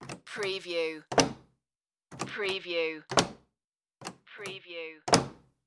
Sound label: wooden door